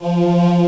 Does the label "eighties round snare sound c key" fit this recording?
No